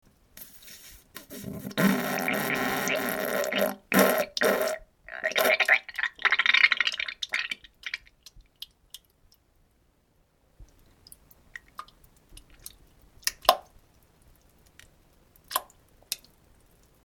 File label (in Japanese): げり おなら ぶうぅぅりゅ ぶぅぅりゅりゅーりゅりゅ 音